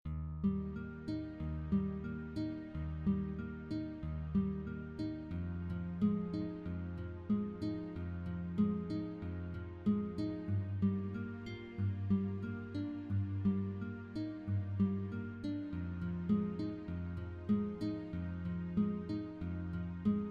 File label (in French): DOUCE GUITARE